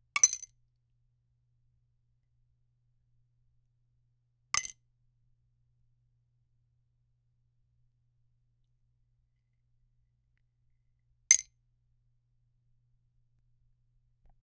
sound of falling fork, clang

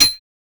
8 bit weapon strike sound

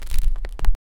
wooden picnic basket, basket

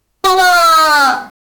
paper bills lightly slid; materials

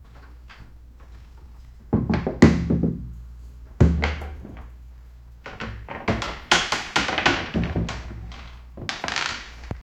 a loud wooden chair break, small room

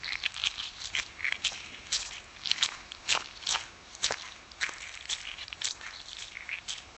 cat dash brush

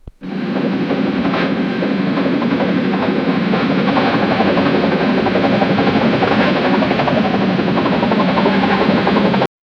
a steam train beginning; steam engine

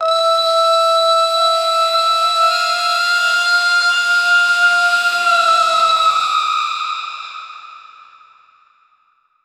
eastern long tribal war d, epic

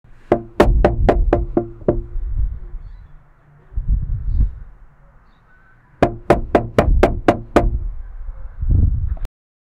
knocking at a gate

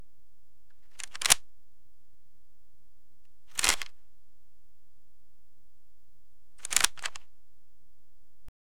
8mm film playing

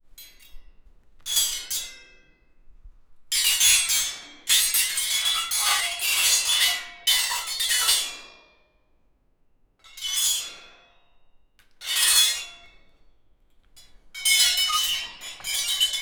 the sound of a forge, blacksmith

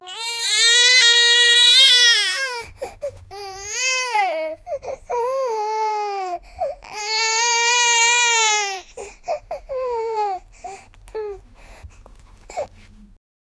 a soft emotional cry, baby cry

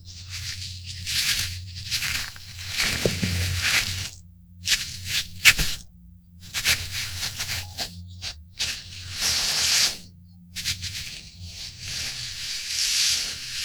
a hand interacting with, grains

